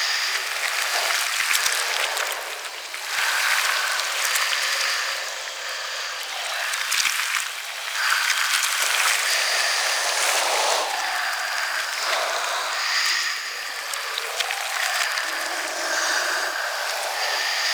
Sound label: Black Goo Ornament Loop; Sentient Devourer